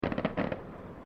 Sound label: Fireworks Multiple Crackles